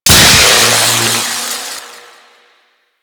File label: Arc Trap Explosion; Explosions; Warframe